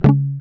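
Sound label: GUI C#, acoustic guitar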